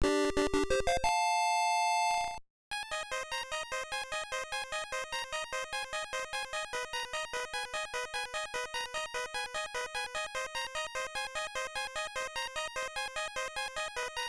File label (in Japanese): レベルアップ; Medarot